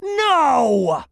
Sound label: death; vortex; sonicnew